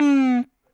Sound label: Drop Hi Speaker, Stylophone Beatbox